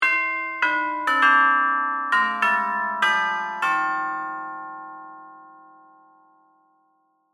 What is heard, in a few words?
Bells Chime Joy, Bells, Chime, Door, Bell; Music, Joy, To, The, World